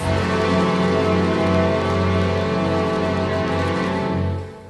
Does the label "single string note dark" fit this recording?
Yes